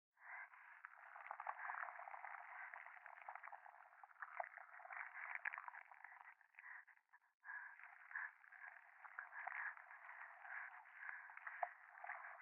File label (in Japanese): 右耳快感吐息 触手